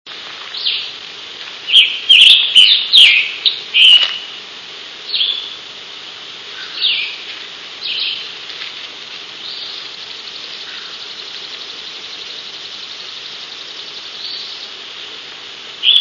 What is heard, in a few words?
tanager, scarlet tanager; birdsongs